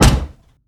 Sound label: Aircrafts Engines Packard Merlin Prop; DCS